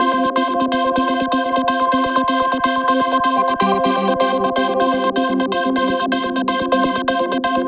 Vocal Pad Bell

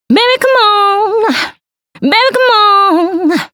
Fem Adlibs B; Disco House

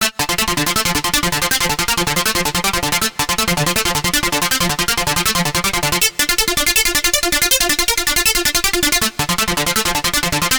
Synth Riff

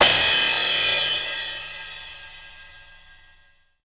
Stretch Crash

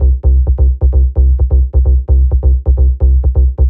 Bassline; 90’s Eurodance

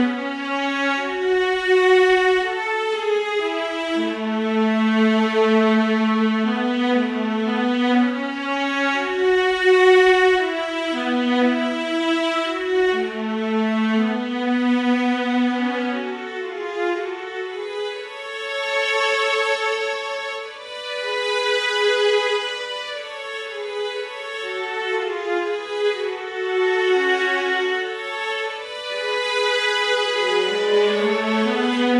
Viola Section; виола

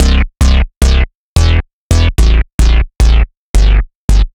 Pulse

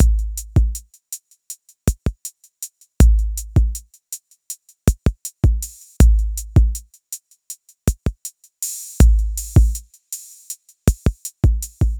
Cellular, ambient

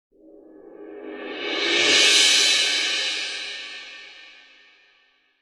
Cymbal Roll

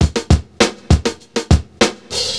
Drums

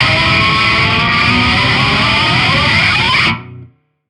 Guitar Scratch, metal; Метал

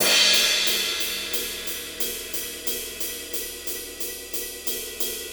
Onbeat

